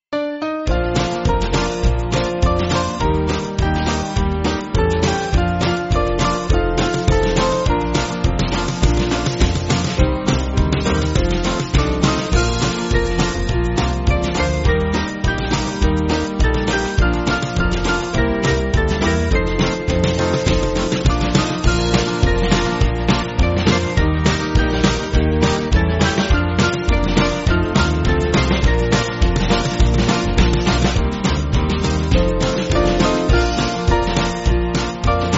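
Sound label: Band, Music Backing Files for Churches; Hallelujah; Ive Been Happy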